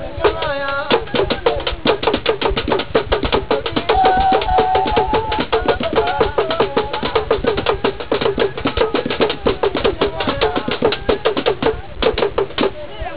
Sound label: Funeral Dancing; Navrongo